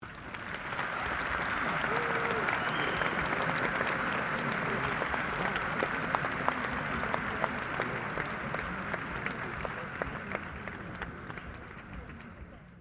Crowd Large Outdoor Event Applause, Outdoor event crowd; Human